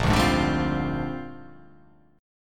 Bb 13th; Bb13 strummed

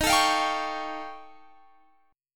Eb 9th Flat 5th, Guitar, Standard; Eb9b5; strummed; x,18,19,22,20,21; JGuitar

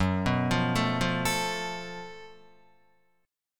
Gb Minor Major 7th Double Flat 5th down Guitar; arpeggiated; JGuitar

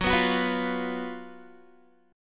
Gb Major 7th Suspended 4th Gb Guitar

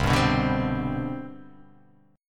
C Major 7th Suspended 2nd C down, chord

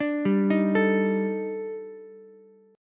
D sharp Major Sharp 11th, Ukulele, C6; arpeggiated; 7,7,11,12; JGuitar

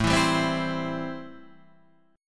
C 6th A, chord

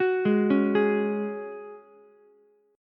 C sharp Suspended 4th C sharp down Ukulele; arpeggiated; JGuitar

